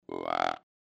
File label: Buck Grunt Big, Deer; Dave Kelso